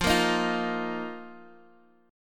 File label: F 7th Suspended 4th F, Guitar, Half Step Down